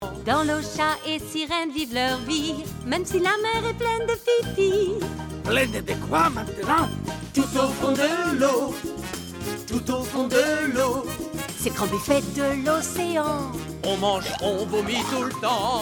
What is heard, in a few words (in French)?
chant enfants, dessin anime; le chat potte